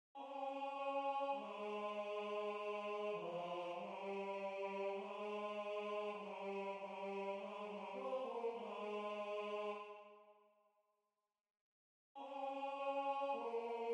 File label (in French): BOEUF, Tenor; Gevaert